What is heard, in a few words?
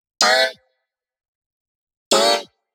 126 D F music, Funky Underground; Loopmasters; Funky Underground Vol 2